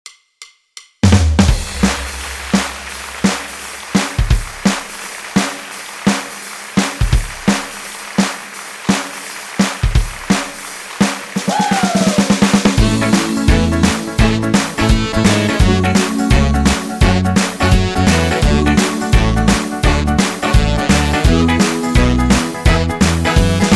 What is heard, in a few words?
Midi Yamaha